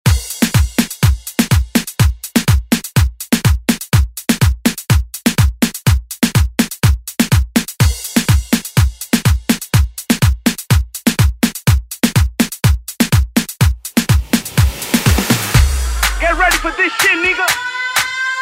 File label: Latin Urban Redrum; Deejay Hit